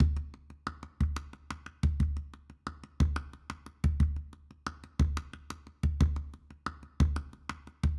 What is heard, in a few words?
afro groove calabash loop 95bpm